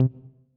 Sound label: soft beep; 18 button and menu sounds